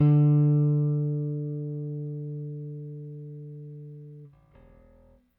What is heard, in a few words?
RickThumb-B, RickenbackerBass; Airwindows